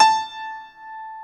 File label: A4 PNO; Keith Emerson Signature Series